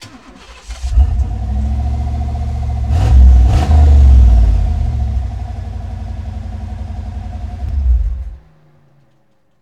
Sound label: 91 Firebird Start Off, Automobiles